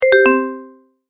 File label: Multimedia Tone-Return/Back